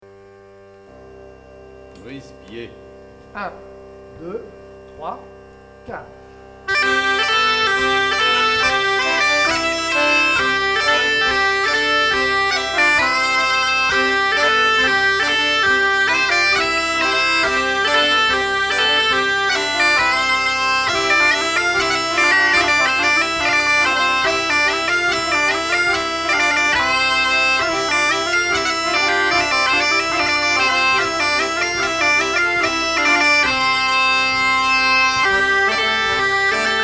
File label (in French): Brise Pied Instr Do lent av arrêts x3